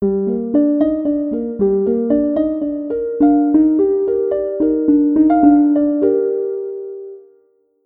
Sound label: Piano Soft Metal